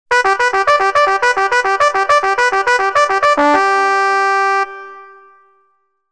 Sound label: Cavalry; Soundzabound Volume 2 Disc 1